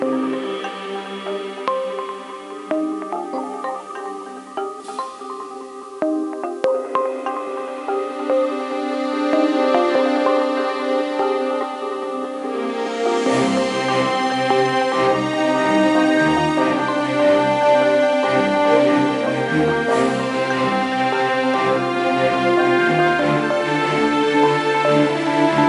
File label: BGM Highrise; gamerip; teenage mutant ninja turtles arcade attack; nds